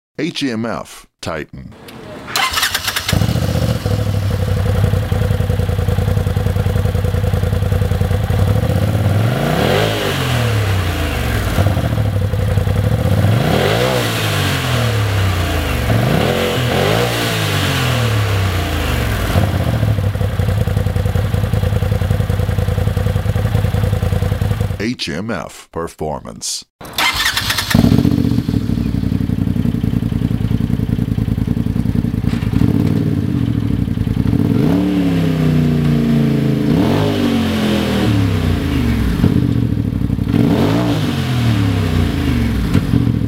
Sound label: rzr xp titan performance sound; hmf